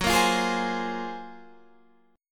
F sharp Major Sharp 11th F sharp

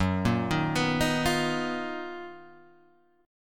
B Major Sharp 11th Gb

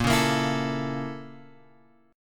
A sharp Minor Major 7th Flat 5th A sharp; A#mM7b5 Chord {6 4 X 3 5 5} strummed

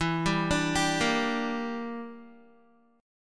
E Minor 7th Flat 5th E, Guitar; Em7b5; arpeggiated; 12,10,12,12,10,x

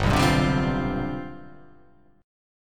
G 11th

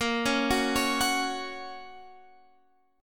F sharp Major A sharp

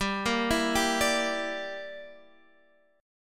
E Minor 7th Flat 5th G

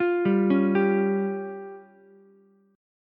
F Suspended 2nd Sharp 5th, F, Ukulele; Fsus2#5 arpeggiated; 10,7,9,10

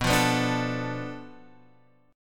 B Minor 7th Flat 5th B Guitar Half Step Down; Bm7b5

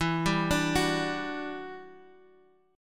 E Minor 9th, Guitar; arpeggiated; chordshape; JGuitar

